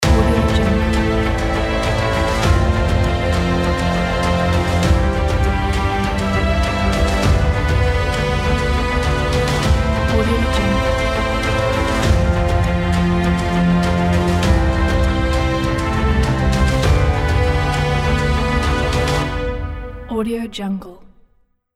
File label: cinematic film logo